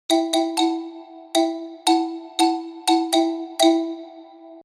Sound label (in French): impro xylo; loto sonore